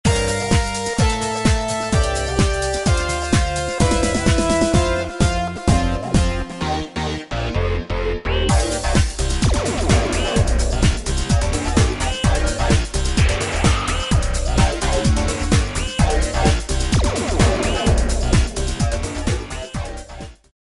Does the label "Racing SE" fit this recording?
No